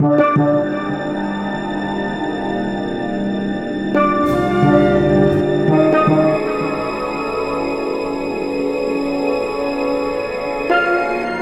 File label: Melody, Hip-Hop